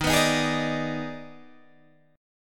Eb Major 7th Suspended 4th Sharp 5th Eb, Guitar, Open G